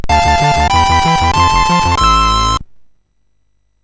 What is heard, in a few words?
sid, MOS6581R3